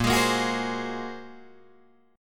A sharp 7th Suspended 2nd Sharp 5th, down, Guitar, Drop D